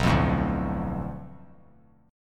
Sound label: B Minor 7th Flat 5th B; Bm7b5; strummed; JGuitar